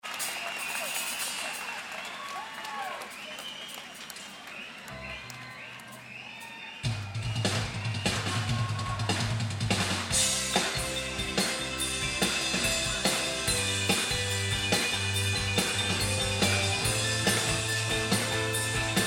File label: Ring The Bells